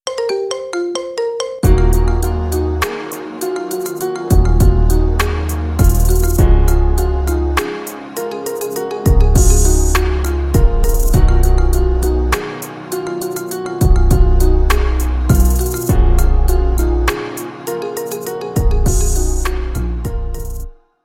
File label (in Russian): marimba remix rington; russian